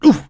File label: pain sharp; Civilian